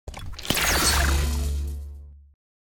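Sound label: pickup, sword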